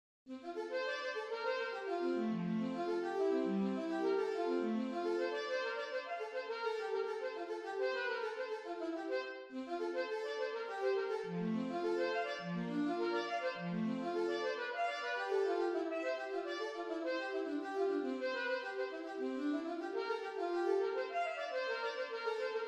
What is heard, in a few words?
Alto Tenor Sax